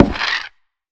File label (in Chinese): 嘎枝之心击打声